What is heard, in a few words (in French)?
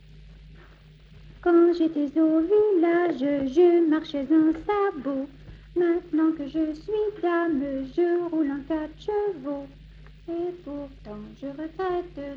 village